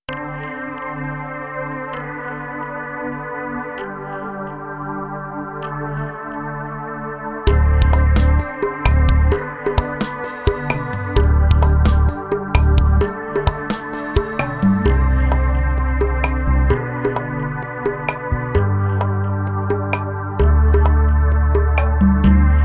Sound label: trance n dance song